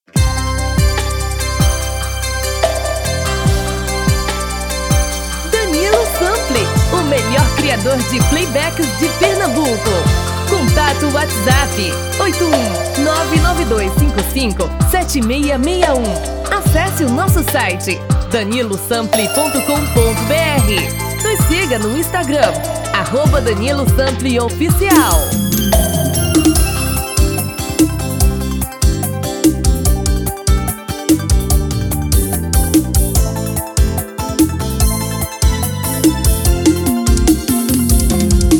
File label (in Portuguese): Brega